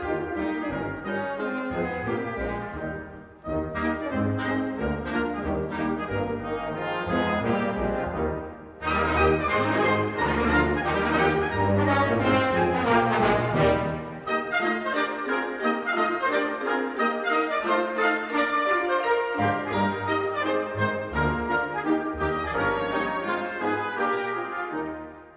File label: Version for Wind Ensemble